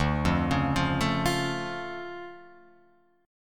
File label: D Minor Major 7th, D, Guitar, Open F; 2,0,1,0,0,0; DmM7 arpeggiated; JGuitar